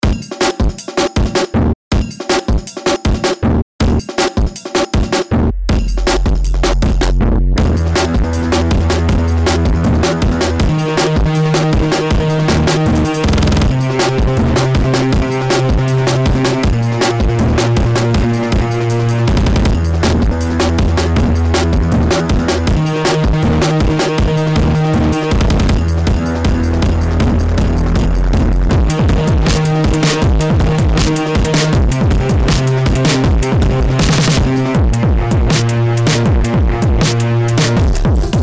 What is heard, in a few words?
Kill Beat; Skoptrum